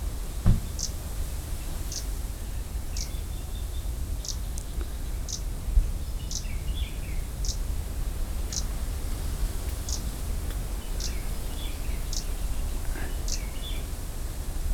warbler kamchatka leaf Phylloscopus examinandus

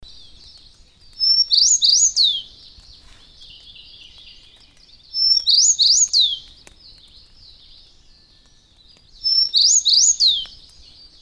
bunting tristram Emberiza tristrami

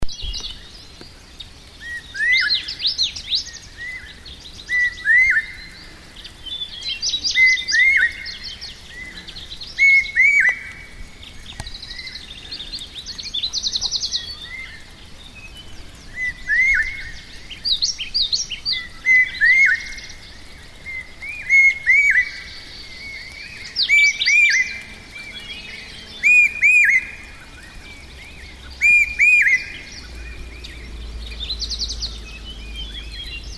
hawk-cuckoo large Hierococcyx sparverioides

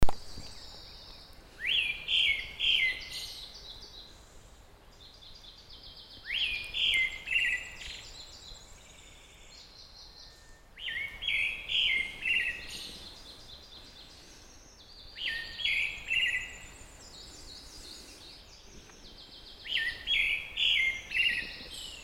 thrush pale Turdus pallidus

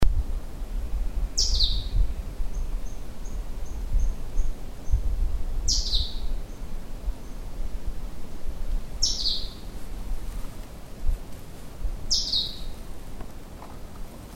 treecreeper humes Certhia manipurensis

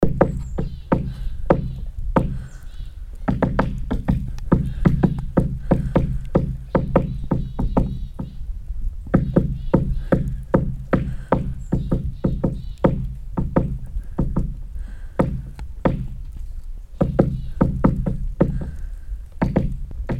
woodpecker great spotted Dendrocopos major; Craig Brelsford

